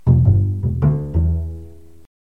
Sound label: Jazz Club Bass E